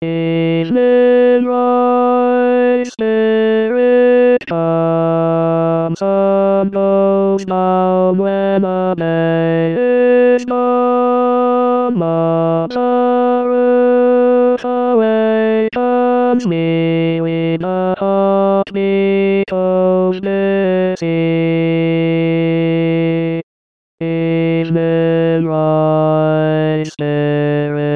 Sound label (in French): voix multiples baryton